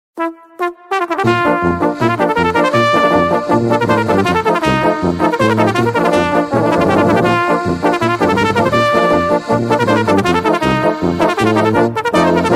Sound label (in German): trompetenpolka